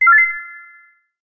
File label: Received Message